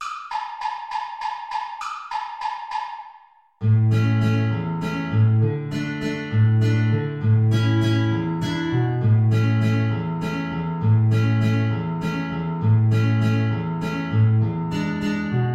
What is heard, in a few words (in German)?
Altflöte & Gitarre A-Dur Play-along; tiefe Notierung